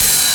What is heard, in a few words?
Hats Electro Open